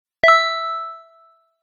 text receive

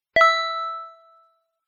text receive

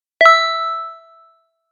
text receive

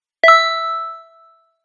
text receive

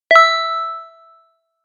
text receive

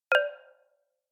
text send; Sound design for the opera composer: concepts and methods

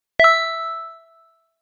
message receive; Sound design for the opera composer: concepts and methods; Vicky and Albert audio cues

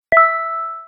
text receive

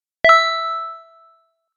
message receive; Sound design for the opera composer: concepts and methods

